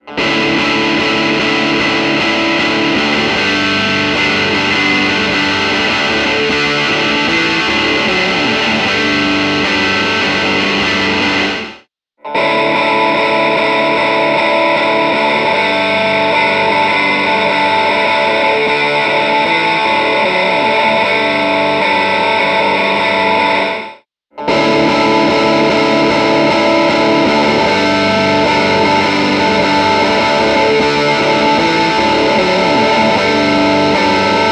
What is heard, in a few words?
resonator sound sample